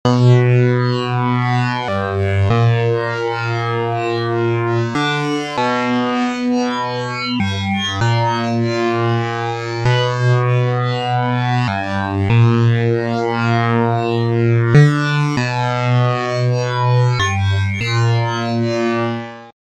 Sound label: Beat